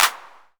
clap D key; percussion; single hits one shots

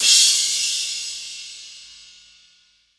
long room reverb crash one shot c sharp key

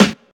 low tone acoustic snare g sharp key

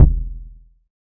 big kick drum single shot e key; kick drum samples

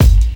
fresh shiny bass drum g key

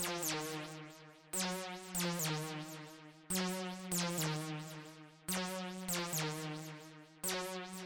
house chords kit hi-fi dim 122bpm

